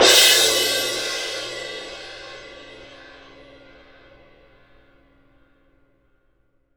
CYM FF, Percussion; ILIO; Synclavier Percussion 2; World & Orchestral

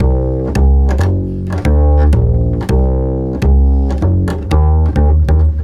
Double bass A# 170bpm, Drum & Bass, Bass Loops; Total Drum&Bass

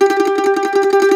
MAND TRM, Mandolin; E-MU Producer Series Vol. 7 – Old World Instruments

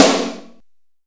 LIVE SNARE; AKAI S6000 CD-ROM - Volume 4; Snare 2

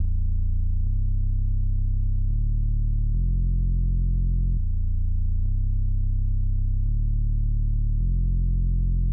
Fmaj 105BPM Bass; 99Sounds; Monosounds; Music Loops; PANDORA BOX!; Smoking Hot

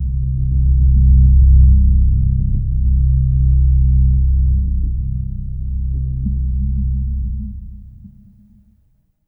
FX, Hip Hop R n B; USB Soundscan vol.19

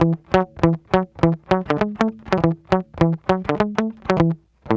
Guitar Loop; Ambient Grooves

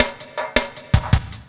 LOOP SD, Drum & Bass, 160 BPM

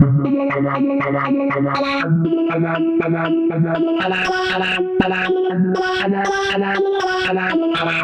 synth arp; Electrocution

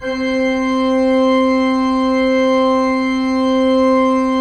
PIPE, 3DOrgans

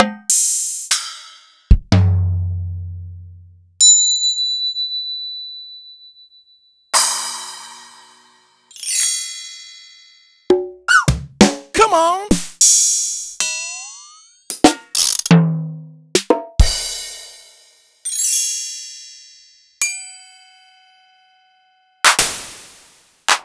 Roland SPD-S; m8

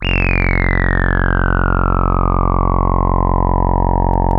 TAURUS A2, synthesizer; Hollywood Edge - Giorgio Moroder Rare Synthesizer Collection

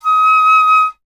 FLT PIC D#, Piccolo; Miroslav Vitous - Solo Instruments VOL-2

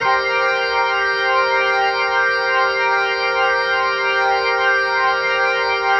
Progression, Organ, Loops; Sonic Foundry (Sony Creative Software); Joe Vitale Organ Donor; Progressions

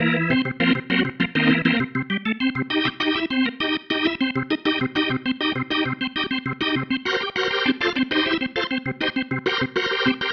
Pattern, Organ

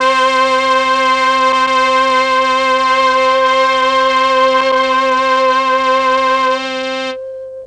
MEL STR, Legendary M400; ILIO; Propeller Island

